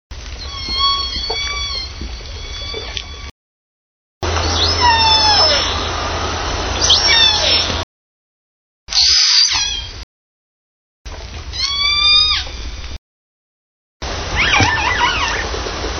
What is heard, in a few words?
K13s Sampling of calls with only K13s, SRKW Orca Calls; Hyde